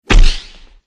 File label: punch